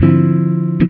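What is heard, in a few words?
guitar chord funk; Univers Sons; Jazzistic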